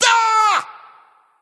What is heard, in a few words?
ugh; smite; ahpuch